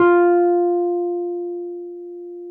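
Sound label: Wurlitzer electric piano; Sonic Reality; Vintage Timetraveler; WURLITZ EP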